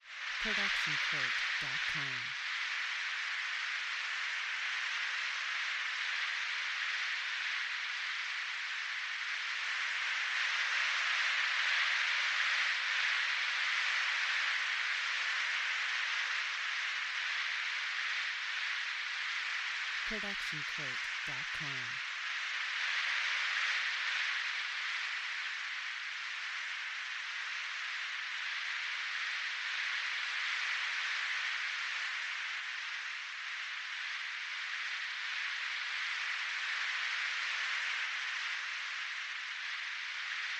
Windy Weather; soundscrate

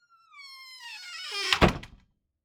squeaky wooden door close; soundscrate

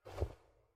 cloth drop; soundscrate

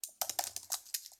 Computer Keyboard Group; SoundsCrate

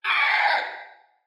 dino screech; SoundsCrate

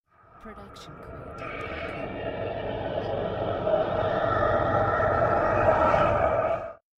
horror rising ghosts; soundscrate

